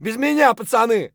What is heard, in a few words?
hurt near death; Escape from Tarkov; scav2